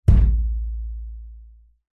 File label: impact metal hollow thump side washing machine